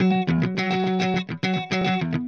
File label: Clean Funk Guitar; Sonic Foundry (Sony Creative Software); Indie Rock Anthems; Session 02 - Clinical